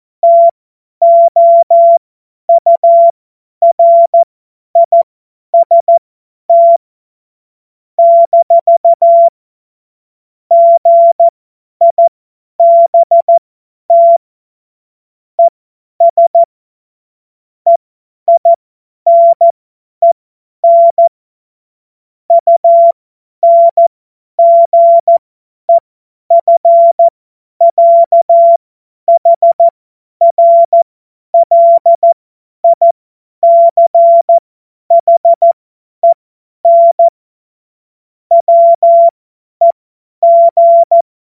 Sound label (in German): Witz, cw morsen; Tempo 50 F70